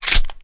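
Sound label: pistol 1911 fast reload mag in; gmod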